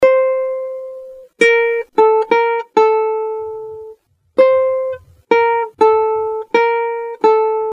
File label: Guitar; D n B